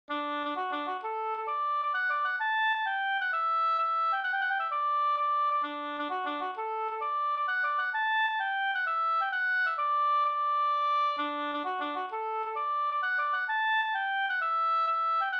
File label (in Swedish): hambopolska